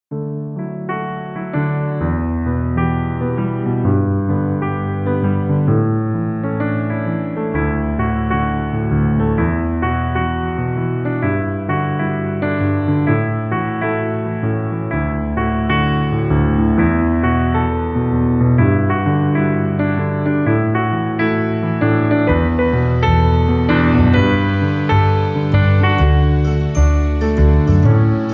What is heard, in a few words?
Piano Cover